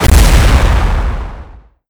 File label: Pulsegrenade Explode